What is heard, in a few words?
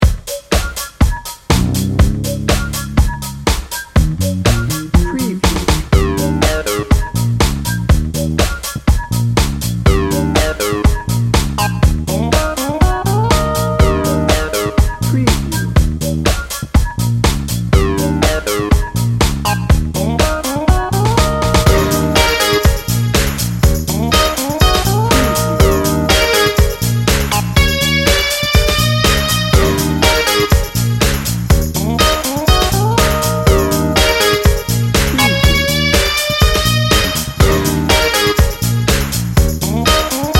party starter